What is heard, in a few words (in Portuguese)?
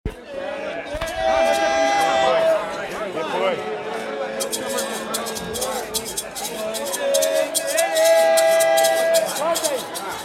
batuque de umbigada tiete se eu errei